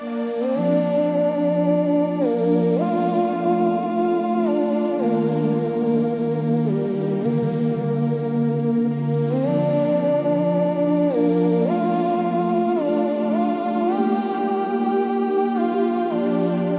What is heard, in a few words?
synth mix; World of Goo 2